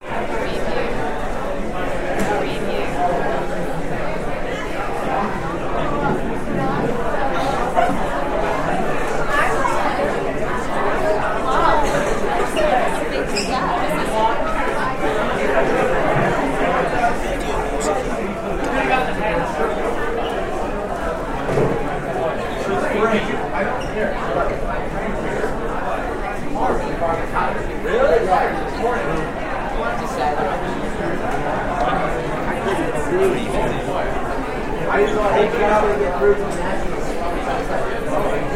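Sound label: College Campus Union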